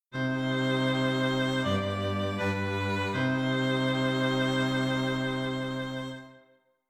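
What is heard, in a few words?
string quartet, dictation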